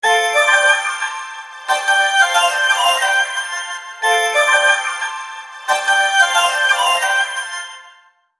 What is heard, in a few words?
happy sound effects